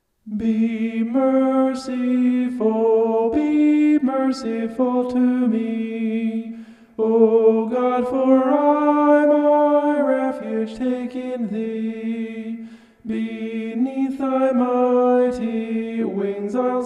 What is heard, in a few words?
vocal homoph; psalm 57